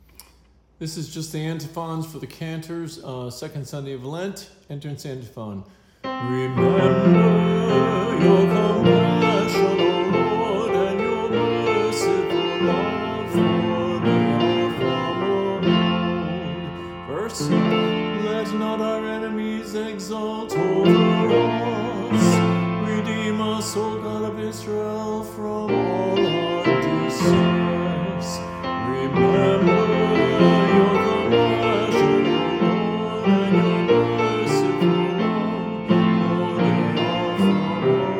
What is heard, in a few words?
Antiphons